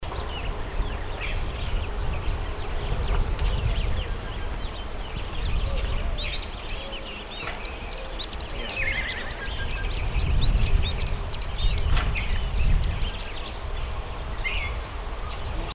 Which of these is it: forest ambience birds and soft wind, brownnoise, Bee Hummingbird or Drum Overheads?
Bee Hummingbird